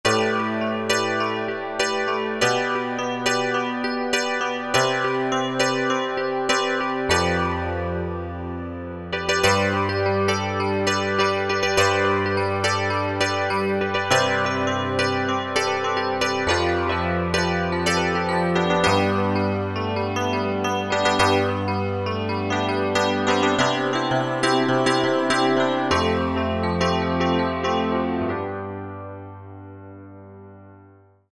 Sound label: E Grand, E-mu Proteus VX